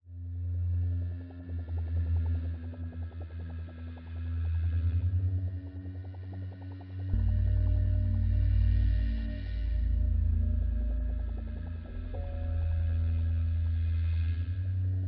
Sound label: creepy loop